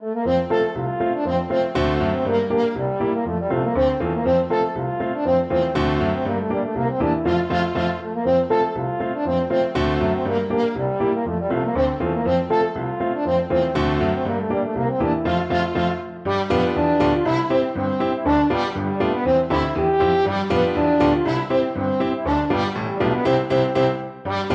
rheinlander polka, french horn

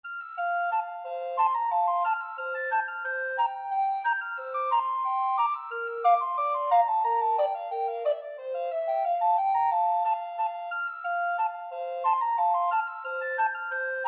sailors hornpipe, recorder duet

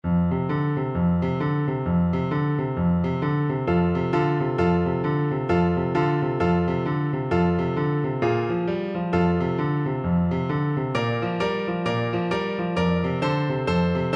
bassoon

